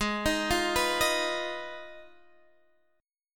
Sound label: G sharp Minor 6th G sharp down